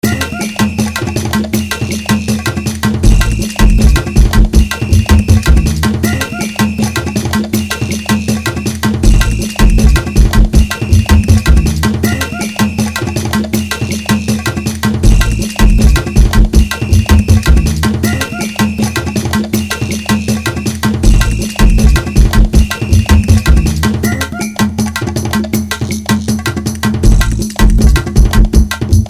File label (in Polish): Walka Z Bossem; Indiana Jack